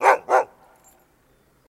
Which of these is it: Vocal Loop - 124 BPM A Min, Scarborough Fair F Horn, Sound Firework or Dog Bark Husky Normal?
Dog Bark Husky Normal